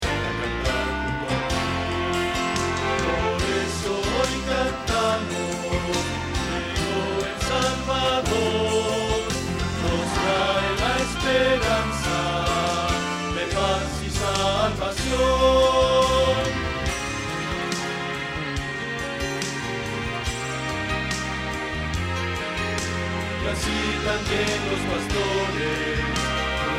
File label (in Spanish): Bajo